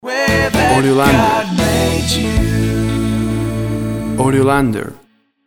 Your Smile Lights Up a Room Short Stinger Mix

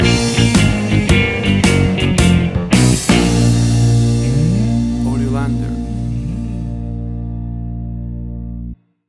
Blues Bounce Stinger; AUDIOLANDER

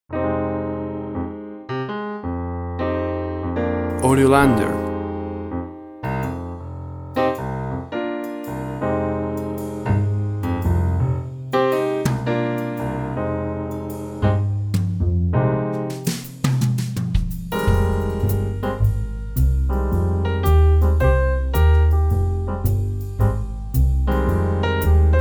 deep in the night jazz, Classic soun; Classic sound of trio format of jazz, cool jazz and blues minor ca

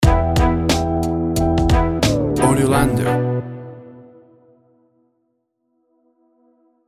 West Coast Hip Hop Stinger

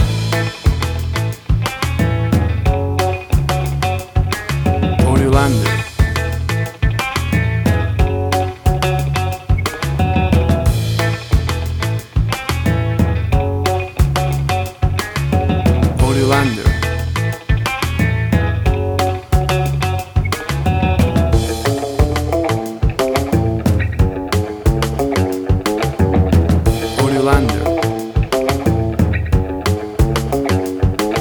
Fifties Jukebox Moods

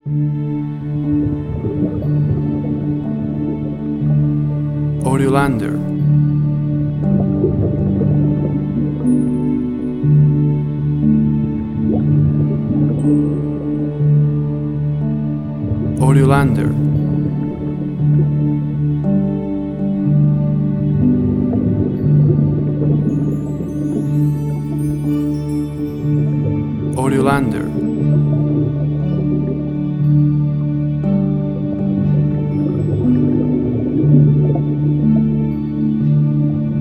underwater

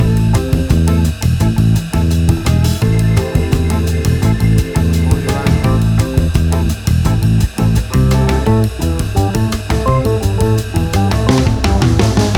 Acid Jazz Cuban Fusion Music; 15 SEC

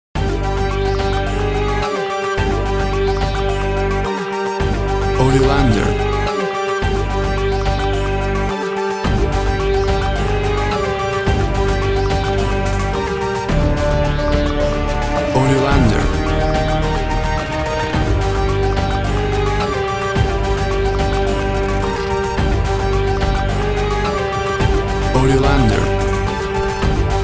Uptempo Smashing Hybrid Action

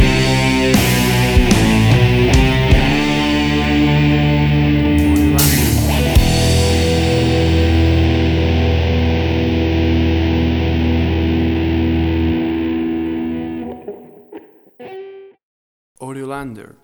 Big Classic Rock Ballad Stinger

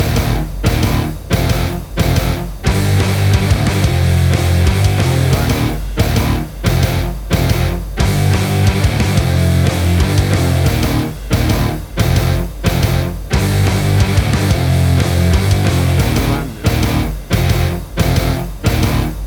Action Punk Heavy Rock Epic Music

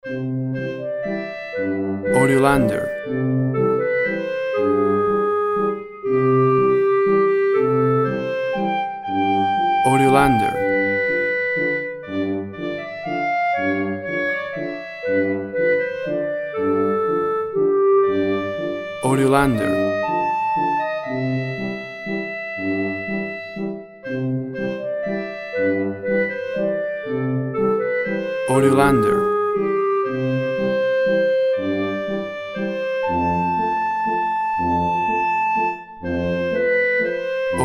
Waltzer

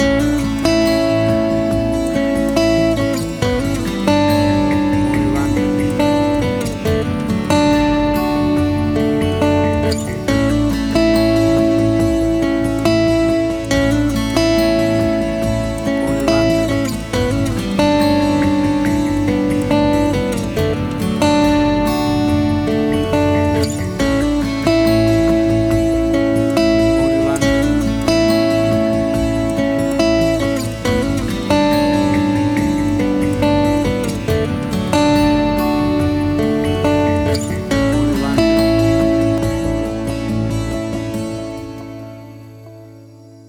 Acoustic Guitar Moods

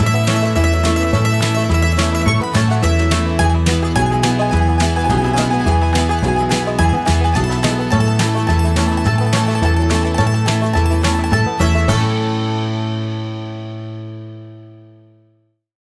Banjo Versus Fiddle; 15 SEC; AUDIOLANDER

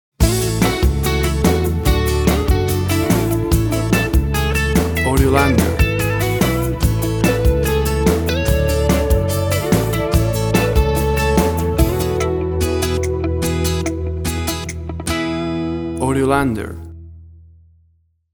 I LOVE YOU STINGER WITHOUT VOCALS

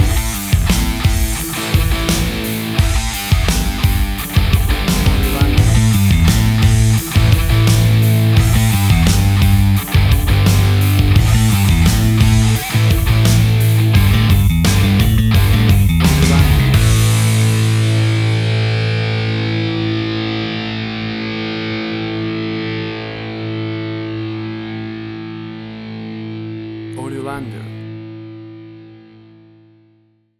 Big Stadium Classic Rock Mix

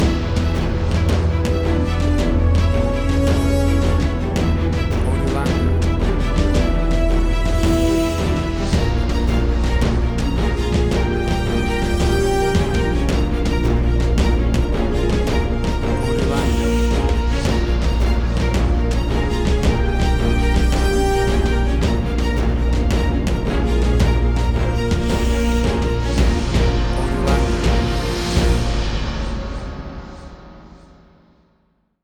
Big Hollywood Action Movie Music

